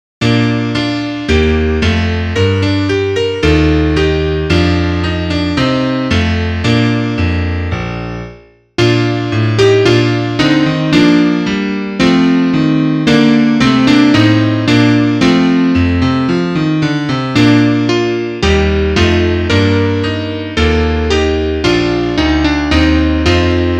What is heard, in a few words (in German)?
Klavier; Liederbuch; alle jahre wieder